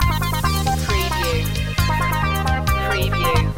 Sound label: kids time loop